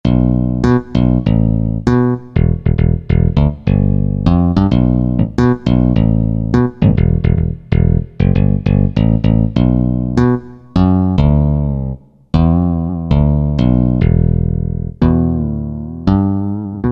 Sticker, Korg MSC-6S Fretted Insts; Fretted Insts 1

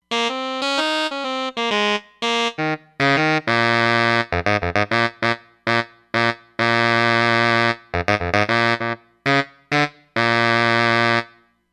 Baritone Sax, Roland Fantom XR

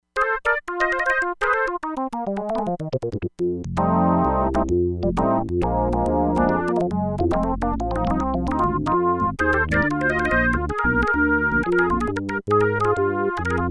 JAZZ ORGAN, Crumar Bit 99; Factory Programs

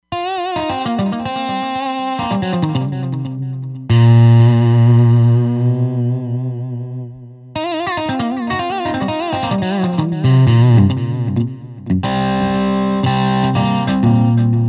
Nice Dist Gt, Roland Fantom XR

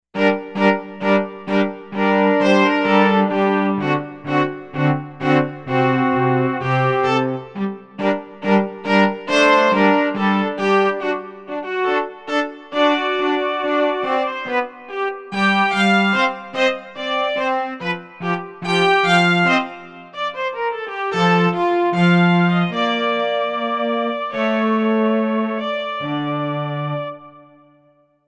Chamber Ens

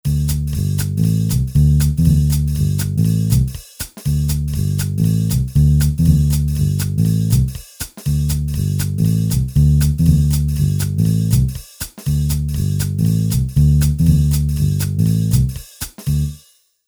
Jazz

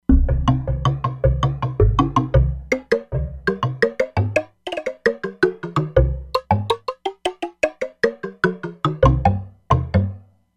Vodun Drums, E-mu Proteus-3; Factory patches

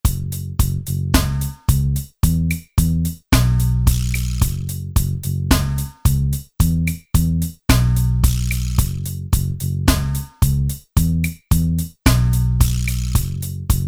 Reggae

